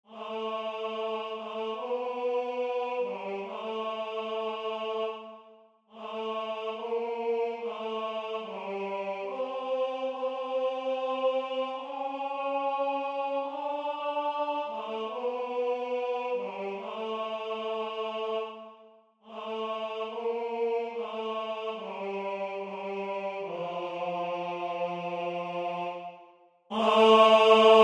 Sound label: bless the lord my soul tenor